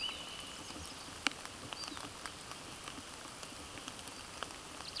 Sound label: Carduelis spinus